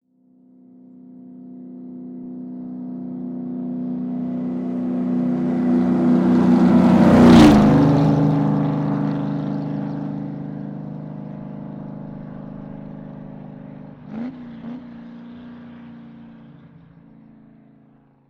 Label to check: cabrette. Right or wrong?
Wrong